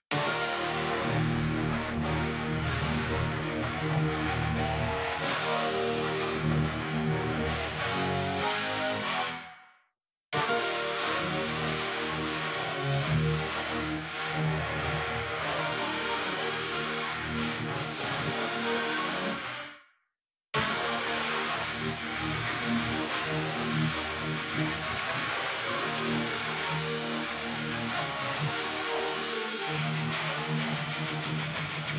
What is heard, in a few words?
Synth